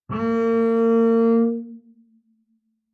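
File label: Bass arco fortissimo sul D A2, Double Bass; MIS Pitches - 2014; Strings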